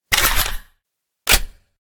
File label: reload, weapons